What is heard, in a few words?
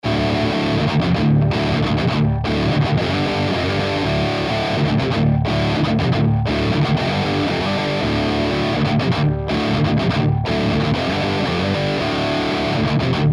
bugera 6262 raw; Marshall JVM